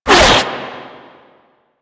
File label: Arm Attack; Enemies; Warframe; Infested; InfestedNinetyNine